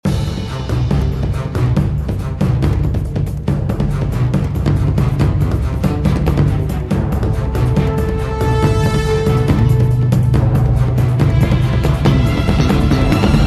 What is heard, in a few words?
taiko fury